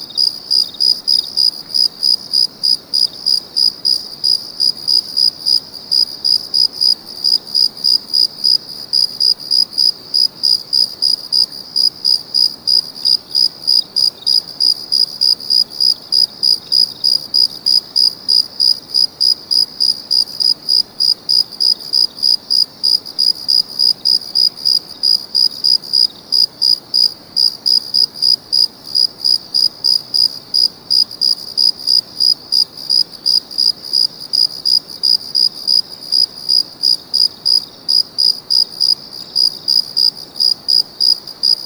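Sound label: sounds of night, crickets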